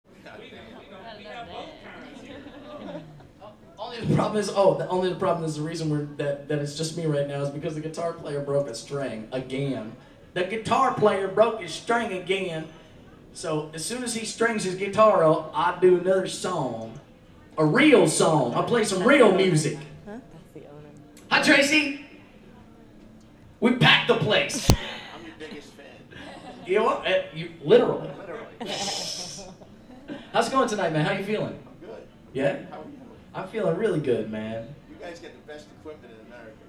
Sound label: broken string